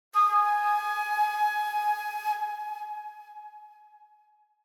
Flute Tone - Harsh; Hollywood Edge; sound designer's toolkit v2

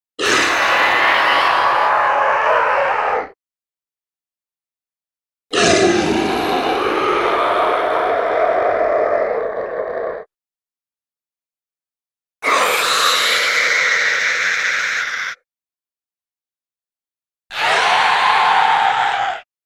Sea Serpent Screams; 4 Versions; Sound Ideas; Series 2000

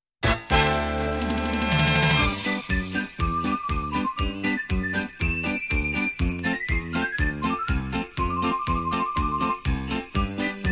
Christmas Filler, jingles

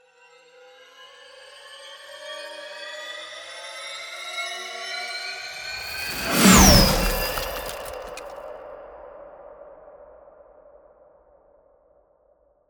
Ice Spell Whoosh, Cold Whooshes; Arctic Aura

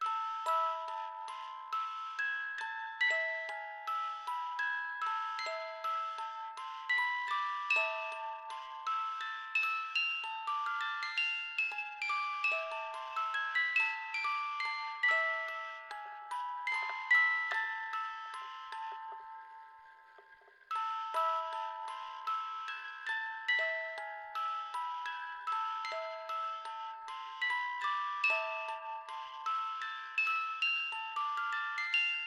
music box; NS Studios - ultra sound collection

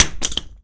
Grenade Bounce; Weapons

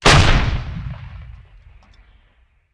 Artillery Cannon Artillery; Sound Ideas; Guns

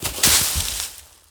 Bodyfall Leaves; SFX Kit; Bodyfalls

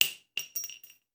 Bullet Shell; Sound Ideas; Guns

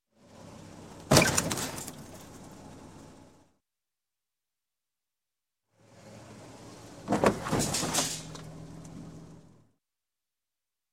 Crash, Auto Small Fender Bender; Sound Ideas 2000 Series

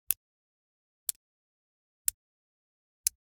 Generic Click Glass Tiny

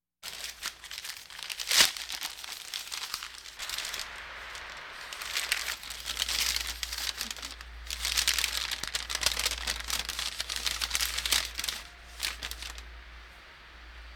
Hospital effects

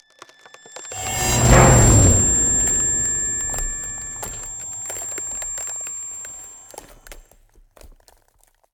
Ice Spell Whoosh, Cold Whooshes; Arctic Aura; EarBreeze

